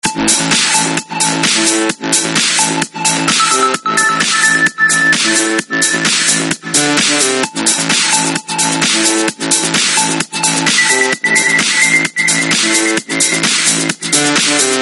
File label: Ringtone, Samsung GT-B3313 Corby Mate; India